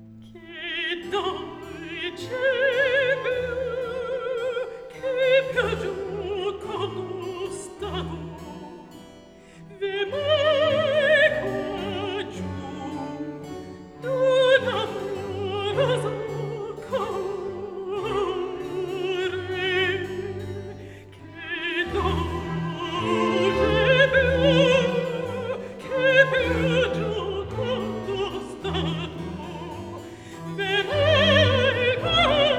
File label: Aria; Scena 5; Alcina; Che dolce piu, che piu giocondo stato; Antonio Vivaldi (1678 - 1741); Vivaldi - Orlando furioso (2004); Atto III